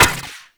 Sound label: Updated Shot; Warframe; Moa Pet; Multron